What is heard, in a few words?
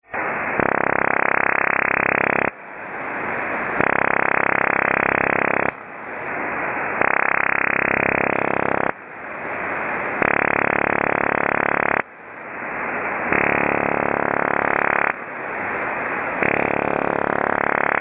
Ionospheric Sounder or OTHR Radar